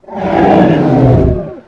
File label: die; diablo